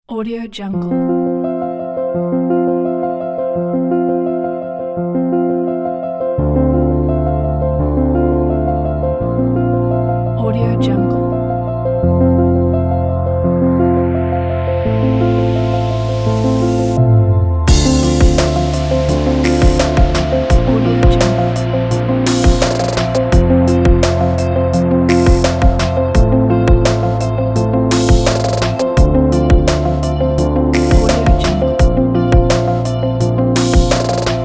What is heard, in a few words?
inspirational and proud hybrid